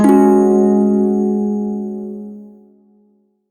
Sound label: Shawzin Yo Chord; Warframe; Prime Shawzin